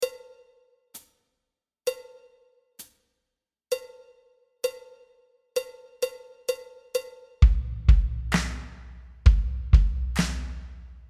Power Rock Playback langsamer

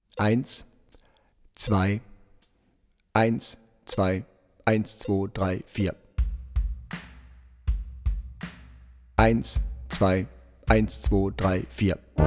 Power Rock Playback Tuba Lauter

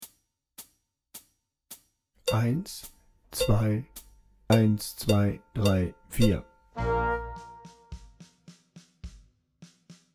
Rock Floete lauter langsamer

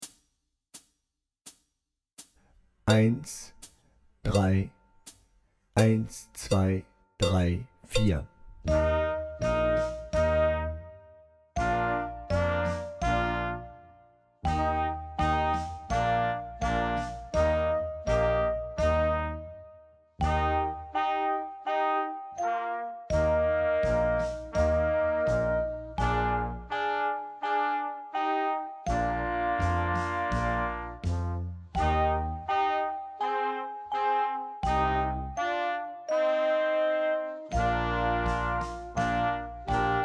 Glockenspiel lauter langsamer

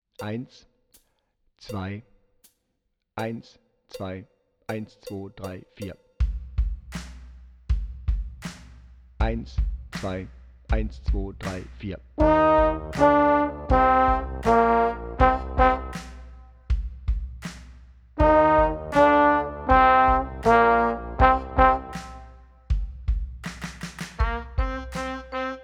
Power Rock Playback Horn Lauter

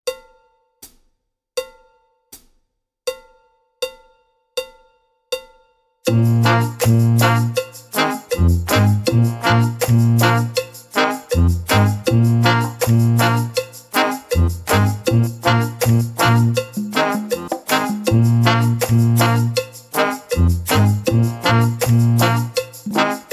Reggae ohne Drums

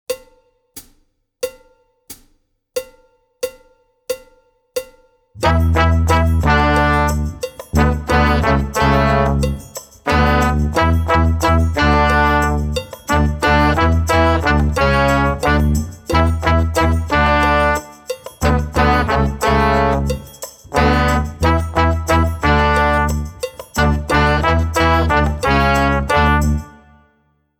Back to Brass ohne Drums

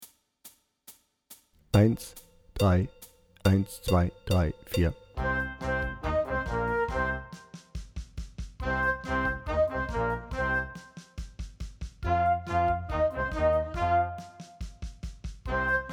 Hard Rock Blues Floete lauter

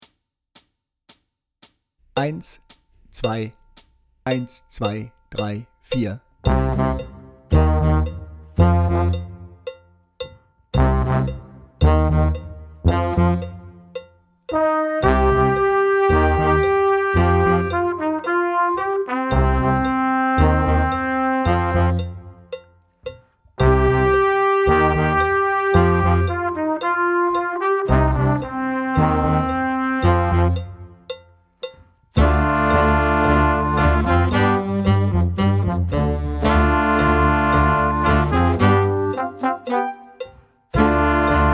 Heavy Metal ohne Drums

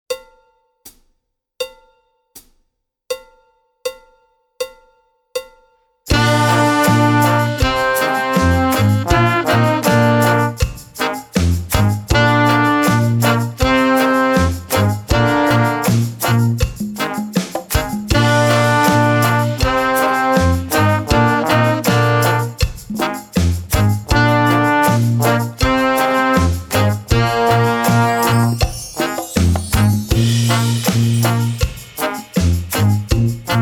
Reggae Melodie

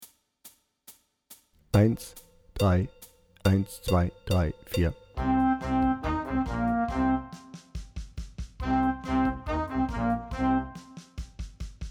Hard Rock Blues Klarinette lauter

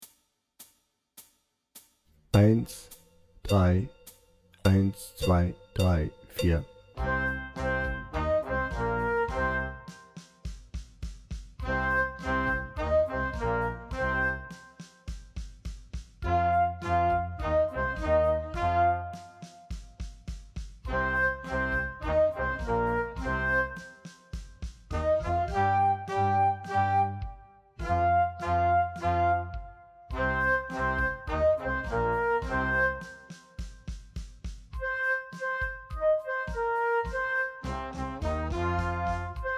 Hard Rock Blues Floete lauter langsamer

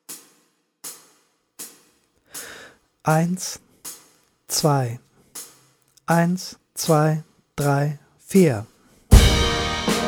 Schlagwerk Glockenspiel Uebetempo

